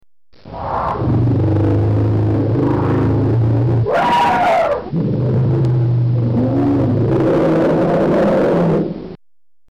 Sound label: asian elephant